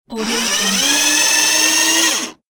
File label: big robot lift movement